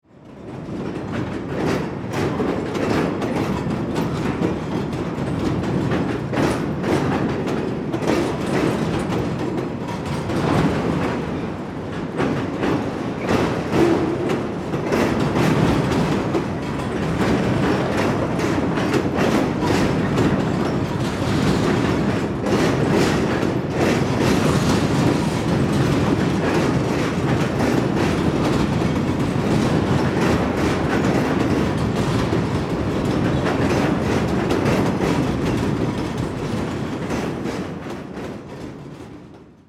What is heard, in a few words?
Heavy cargo train movement